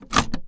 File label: Stereo Cassette; Five Nights at Freddy's; FNAF 2